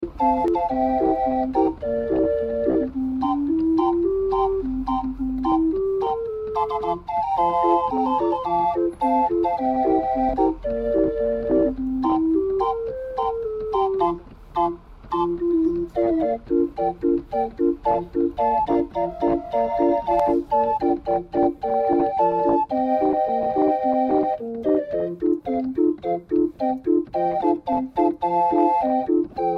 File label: organ grinder; germany berlin museum isle